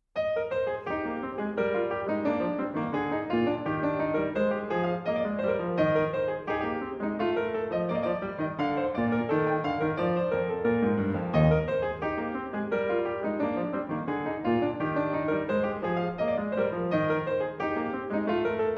French Suite No.4 in E Flat, Gavotte; BWV 815; Vladimir Ashkenazy; Bach French Suites, BWV 812-817

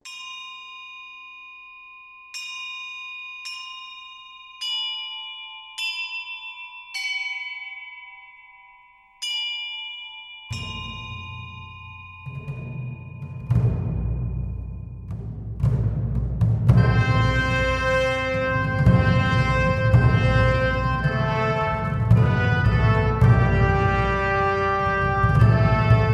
Fanfare

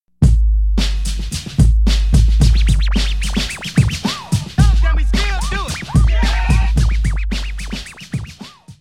Beat (fragment)

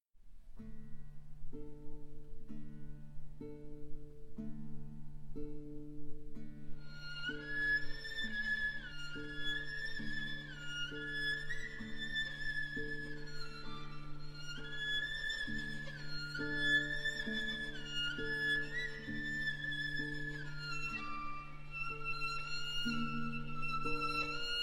Romanian Folk Dance, In One Spot, Violin & Guitar; Sz. 56; Cantabile Violin & Guitar

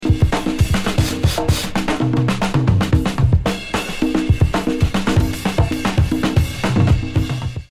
Break

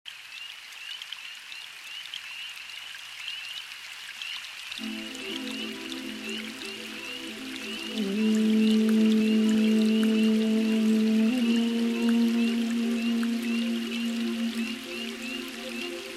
Natural Relaxation, Delicate Rain

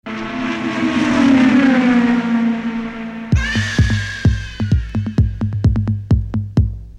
Effect

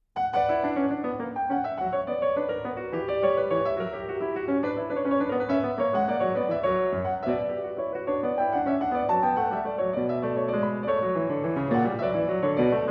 French Suite No.3 in B Minor, Courante; BWV 814; Vladimir Ashkenazy; Bach French Suites, BWV 812-817

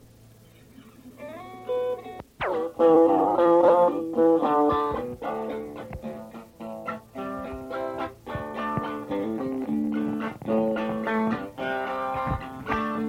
Instrumental

